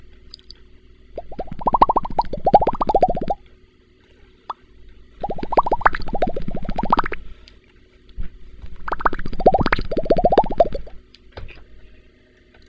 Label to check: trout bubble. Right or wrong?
Right